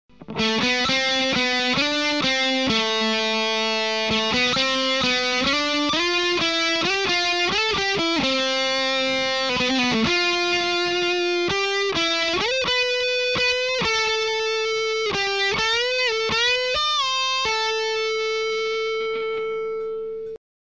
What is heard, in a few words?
M1000 Lead Carpet, ESP Horizon LTD M1000 Improvisation Solo